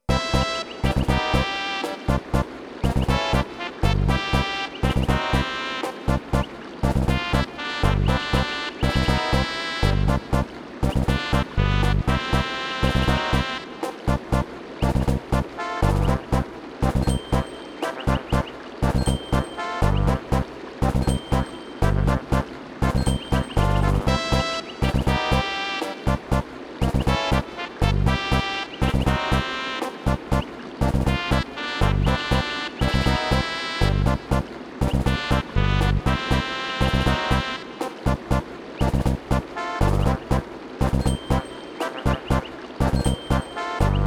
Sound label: Victory!